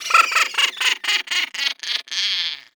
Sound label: sus laugh